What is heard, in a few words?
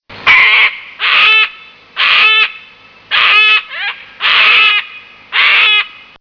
cacatua galerita